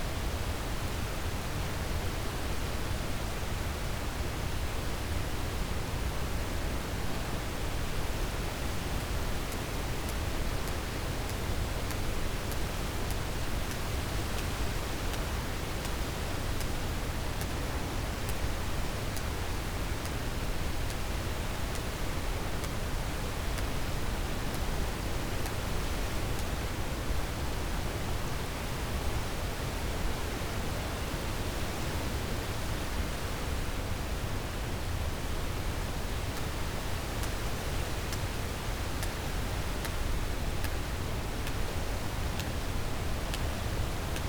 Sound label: cach bombyx CACHALOTS